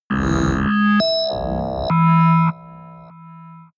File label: synthesized bells low; digital